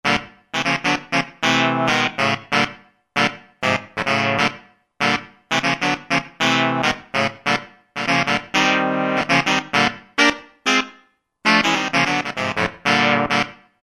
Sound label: 40's Chord; Roland SR-JV80-12 Hip Hop Collection